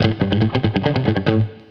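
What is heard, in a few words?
Country Guitar (Ending); Digidesign; Pro Tools Essential Loops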